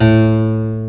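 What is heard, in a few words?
buff stop A1, harpsichord; AKAI S-Series CD-ROM Sound Library VOL-2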